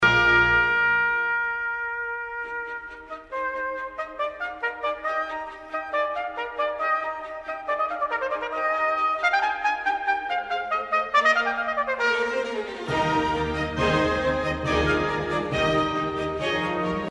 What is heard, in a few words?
trumpet concerto e flat major; haydn